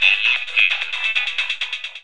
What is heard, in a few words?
radio; BBC